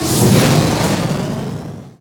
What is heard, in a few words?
sunray beam; weapons; dota; phoenix